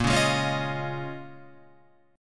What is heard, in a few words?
A sharp Minor 11th, Guitar, Open F; 10,8,8,8,8,10; strummed; JGuitar